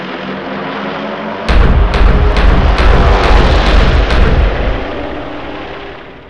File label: weapon